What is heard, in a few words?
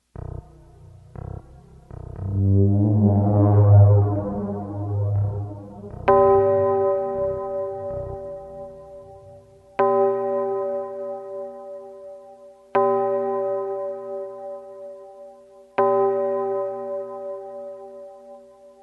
Neck Bite; Dracula